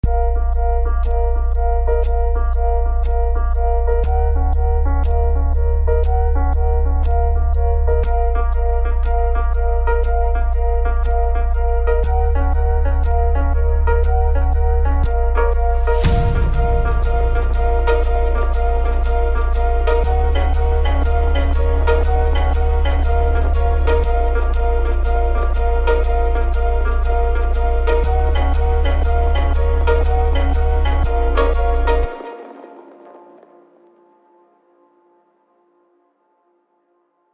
Cinematic Electronic style

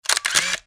webcam capture

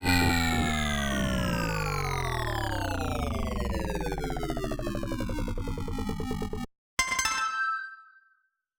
win; upgrader

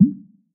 quick Reel Stop; berry burst

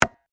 click

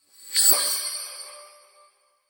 continue effect

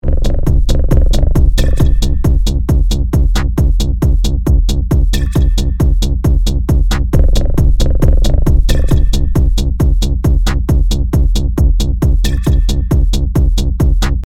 arp

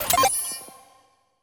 Mine Activate

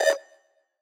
Homing Missile Targeting

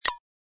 click

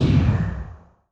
Air Woosh Underwater

